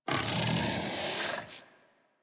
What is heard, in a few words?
Kubrow Armored Vocal Breath Walk; Warframe